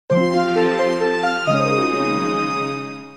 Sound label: Winds